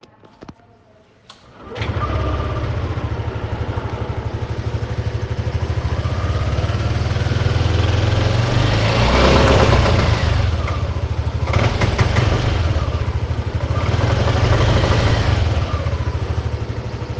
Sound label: xmax 125; yamaha; maleta